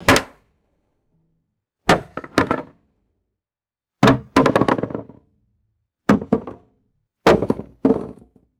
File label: heavy wood beam dropping on concrete